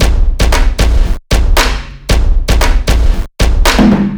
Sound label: RnB Drums, электро поп